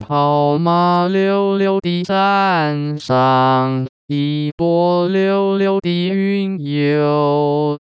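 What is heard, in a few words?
synthetic singing voice, 男聲, smoothed; 使用頻譜包絡內插之國語歌聲合成 (Mandarin Singing Voice Synthesis Using Spectral Envelope Interpolation)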